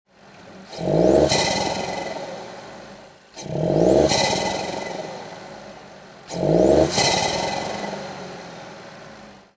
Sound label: Stand, intake 1.0t-1.5t ea211 evo; Ansaugung